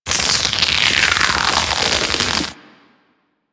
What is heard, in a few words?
Fist Projectile; Enemies; DE; Warframe; Tyl Regor; Fists